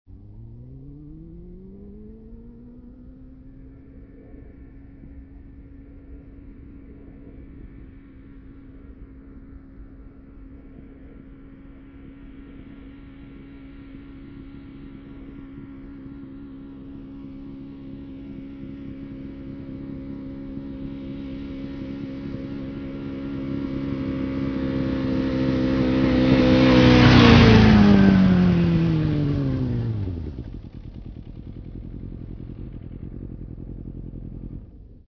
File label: motocycle